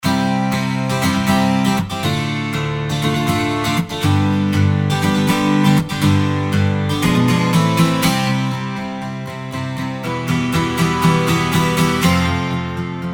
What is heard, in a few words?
Acoustic Guitar; Guitar Tuesday